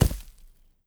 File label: grass; Rust